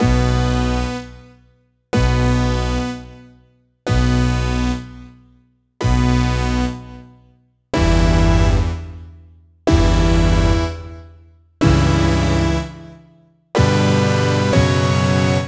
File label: Power Chords; Sonic Foundry (Sony Creative Software); Club Joints and Street Anthems; Game Time